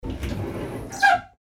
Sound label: door sliding close